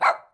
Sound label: glitched tones Dog Shih Tzu Bark Single